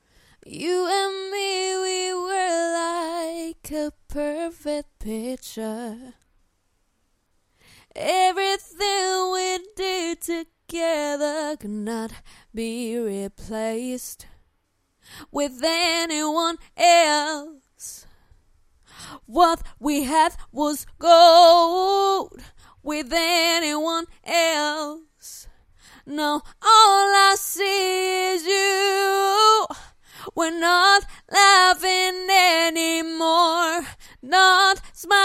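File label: pop, 127, A minor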